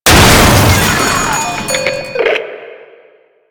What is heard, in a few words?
Phonebooth Explosion; Enemies; Lasrian Tank; Warframe